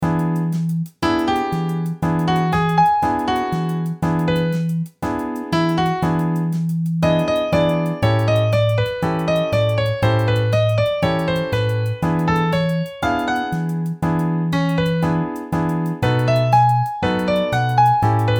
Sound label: 12bar